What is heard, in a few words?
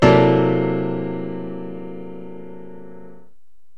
Mystic Chord; Scriabin